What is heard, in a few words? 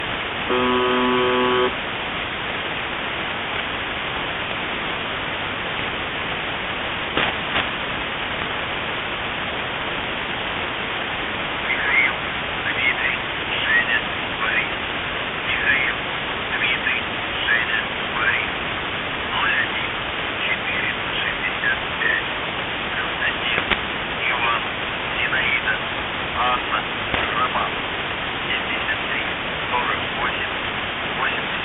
buzzer